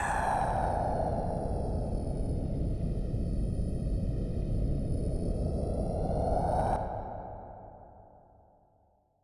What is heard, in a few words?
Swoosh FX, Club Techno, 142 BPM; Zero-G; Construction Kits